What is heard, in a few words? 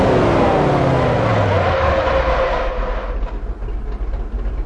throttle off, vehicles; gmod; tdmcars; van